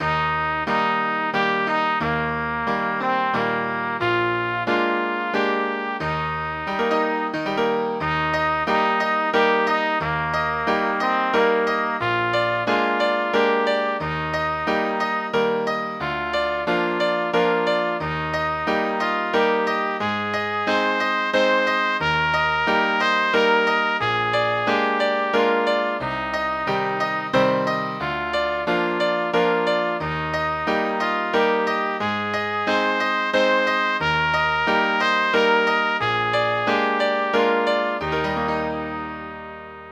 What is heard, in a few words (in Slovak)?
pre trúbku a klavír